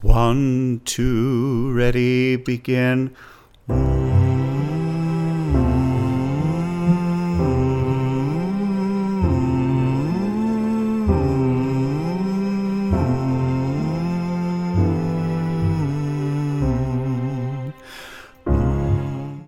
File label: Straw